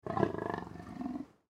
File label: Leopard Growl, Leopard Growl Breathe; Leopard Sound Effects; Animal